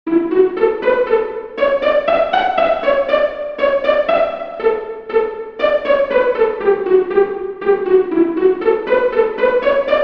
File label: Solo Break 120bpm, mandolin